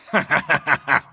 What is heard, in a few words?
laugh